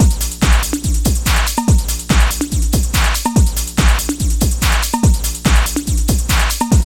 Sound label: LOOP, Tekno Industrial; East West